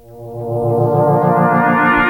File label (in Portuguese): ORGAO; INSTRUM